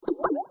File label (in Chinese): 气泡弹出